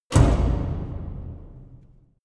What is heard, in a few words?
orchestra grancassa wood